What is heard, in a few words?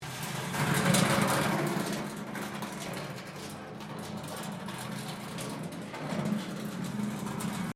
metal rubbish bin drag concrete; Sound Effects:Materials